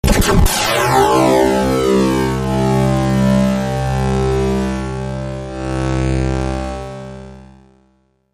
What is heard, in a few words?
Flange Gun